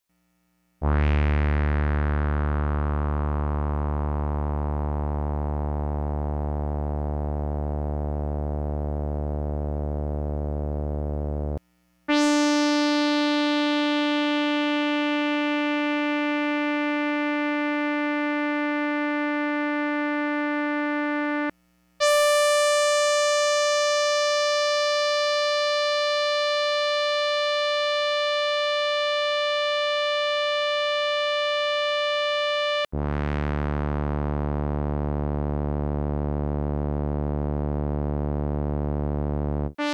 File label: Compare CS80 Brass; Xils